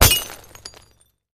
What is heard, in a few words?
Bullet Impacts Glass